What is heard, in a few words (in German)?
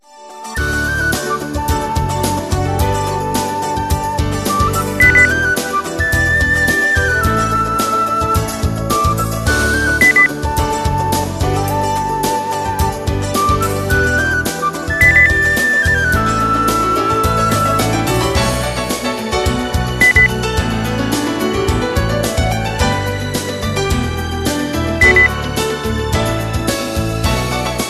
gute laune grenzenlos gluecklich beep